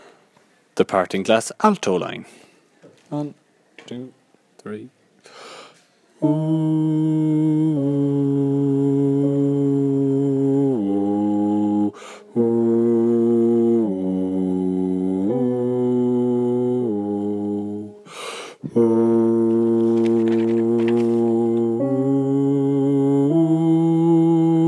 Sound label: Alto; The Parting Glass